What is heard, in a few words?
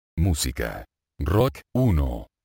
rock